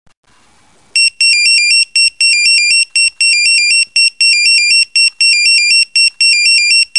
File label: Pager sound